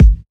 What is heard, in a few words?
Kick G; Aera; Smasher